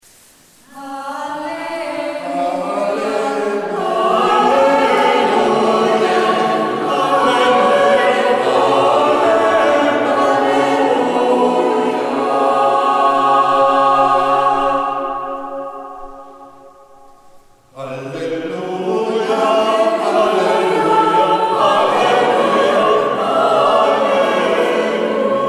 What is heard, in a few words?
Halleluja